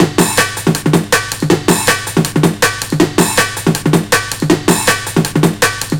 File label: 160, Drum Loops, Drum & Bass; Total Drum&Bass